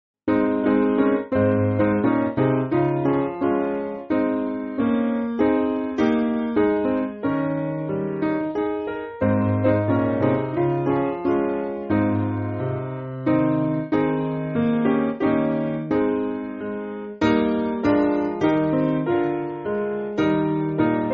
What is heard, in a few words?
Lux Benigna, Piano; Lead Kindly Light